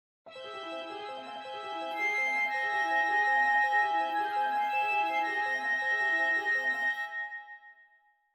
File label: Delicate thinking softer